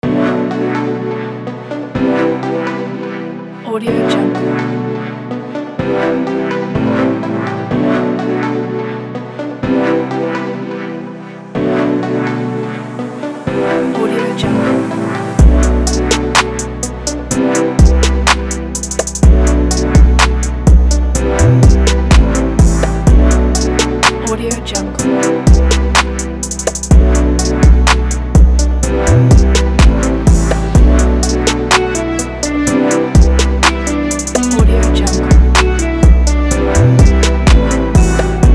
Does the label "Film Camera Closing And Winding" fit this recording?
No